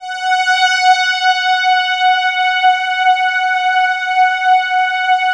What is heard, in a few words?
DENSE FL, PADS; Masterbits; Vintage Voltage